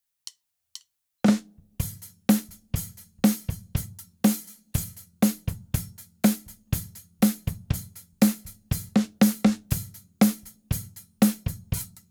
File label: Drum Kit